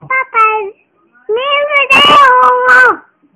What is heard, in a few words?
mail